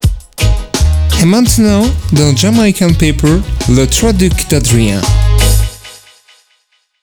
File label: jingle